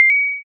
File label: bling